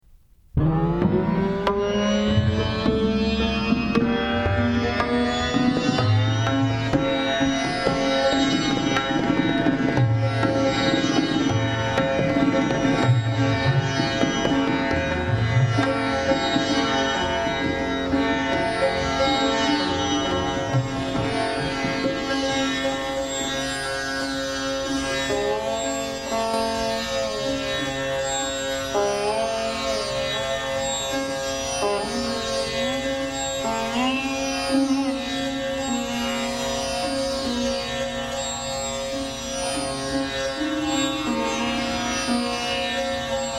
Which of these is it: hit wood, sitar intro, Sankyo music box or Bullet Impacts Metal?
sitar intro